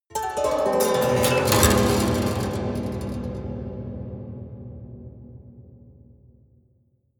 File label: haunted strings down stroke fast hard